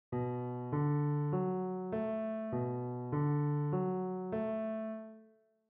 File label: arp